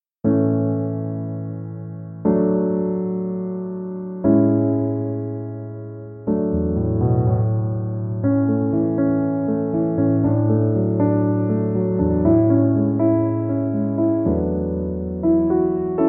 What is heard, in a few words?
Piano Instrumental